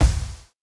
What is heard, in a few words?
fungus balloon death final pop; Hollow Knight